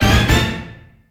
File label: Quiz Fanfare